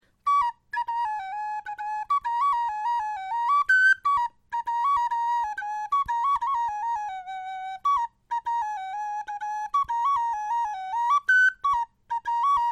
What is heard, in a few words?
melodie, knochenfloeten